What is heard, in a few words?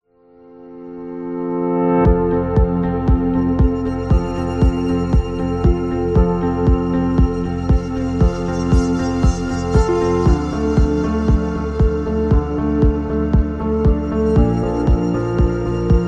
Guitar Optimistic